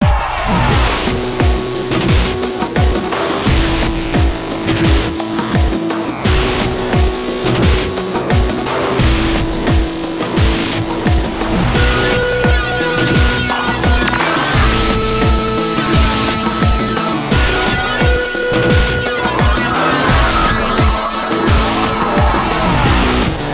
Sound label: Fight Loop; Transformers: Revenge of the Fallen; PS3; Windows; Xbox 360